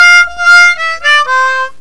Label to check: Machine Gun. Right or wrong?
Wrong